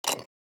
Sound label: submenu barrier open